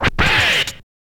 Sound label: scratch short, Vinyl scratches